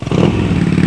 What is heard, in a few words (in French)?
accelerer